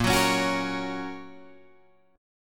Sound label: Bb Minor Sharp 5th, Guitar, Open G; 8,11,11,11,11,11